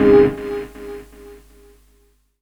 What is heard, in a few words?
Soul Chord; Jungle; Blu Mar Ten - Jungle Jungle - 1989 to 1999 Samplepack